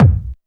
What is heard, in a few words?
kick techno, drums; Techno Trance Essentials